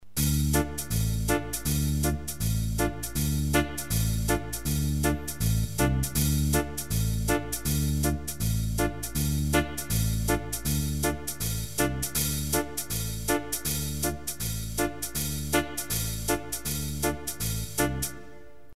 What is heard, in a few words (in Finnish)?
Foksi eivirvelia; Kevyt back beat esim. kosketinsoittimilla tai kitaralla; Nelijakoinen